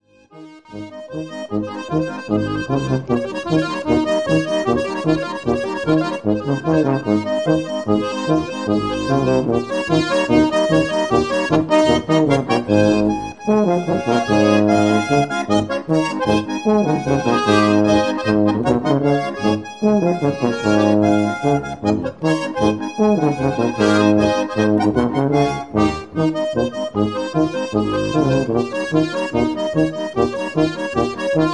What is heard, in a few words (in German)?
trompetn echo